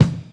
sharp kick drum one shot e key, kick drum single hit tuned to the E note; kick drum samples